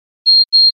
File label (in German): Digitaluhr Piepton